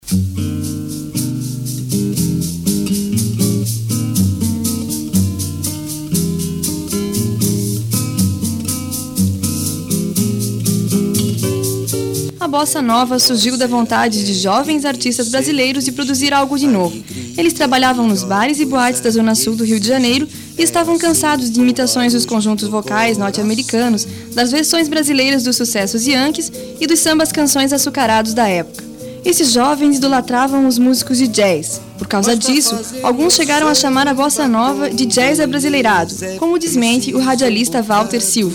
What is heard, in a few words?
Bossa Nova